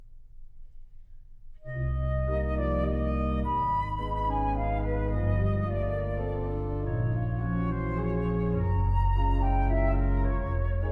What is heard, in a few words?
Three Pieces for Flute and Organ - II. Elegy